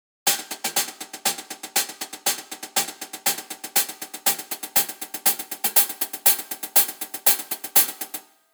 10khz added to a hi hat

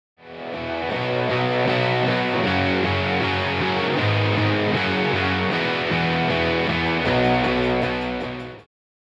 electric guitar with 600 hz

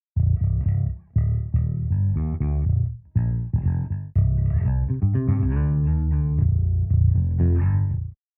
bass guitar flat without eq

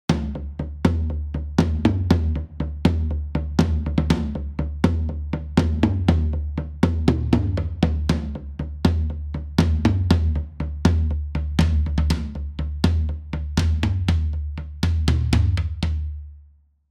tom drum with 400hz then 400 hz filtered out

